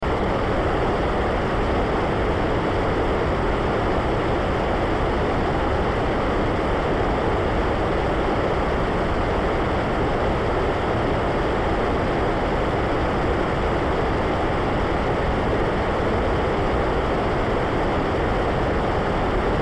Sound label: low noise; ５分版